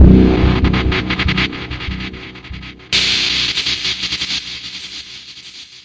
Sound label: guitar stutter blasts, looping ambience